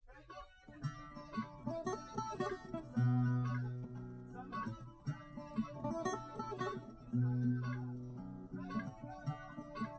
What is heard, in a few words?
nodrums, liverec, src; SiSEC08; SiSEC underdetermined